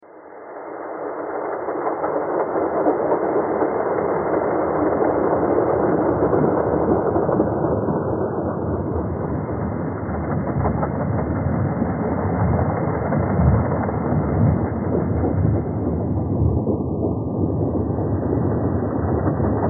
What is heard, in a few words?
train thread all